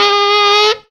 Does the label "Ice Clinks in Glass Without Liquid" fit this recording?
No